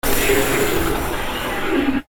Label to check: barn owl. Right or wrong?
Wrong